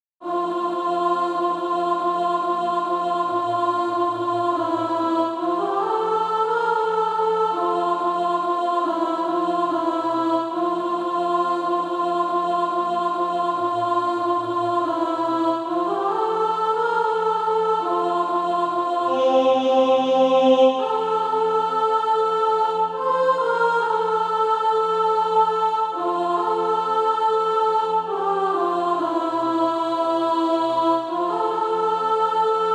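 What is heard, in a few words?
alto, Practise Music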